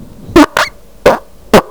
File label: fart